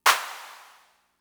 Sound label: Clap, Fricke MFB-522, Drum Machines